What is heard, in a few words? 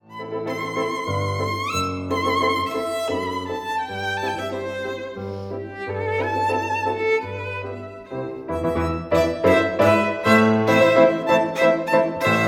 VIOLIN